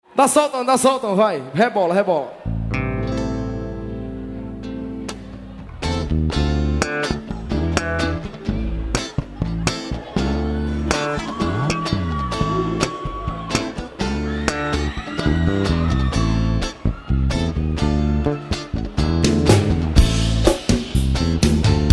Forro 3x4 conga

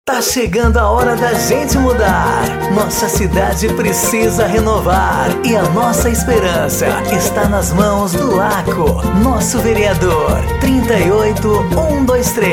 jingle, parodia de samba e pagode; para prefeito e vereador eleicoes

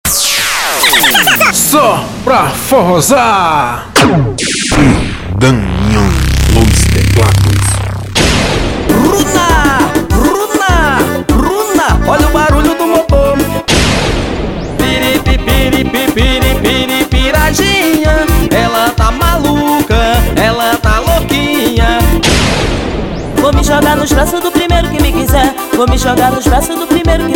forrozar

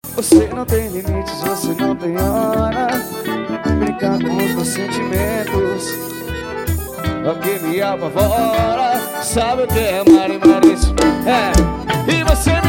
acustico forro; bessa grill

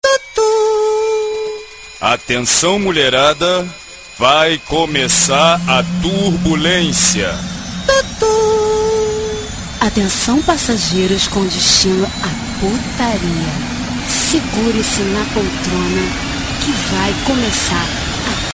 abertura

